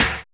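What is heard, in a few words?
tek percussion hi; Drums In Your FACE!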